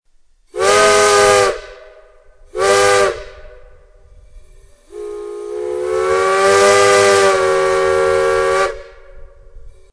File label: 3 Chime, steam whistles